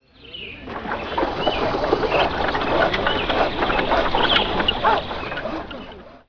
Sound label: city, Ambient; Zeus